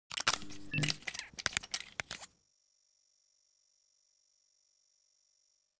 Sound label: Remote Antenna On Frequency